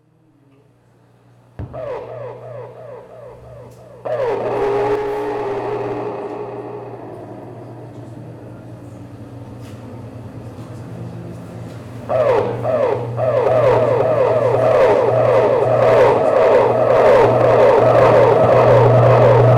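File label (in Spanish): Concierto Noise, Improvisacion Noise; Sonemalab; Sonido memoria Y Ciudad; Carlos Bonil; Benjamin Calais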